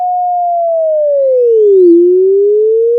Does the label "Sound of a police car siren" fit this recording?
Yes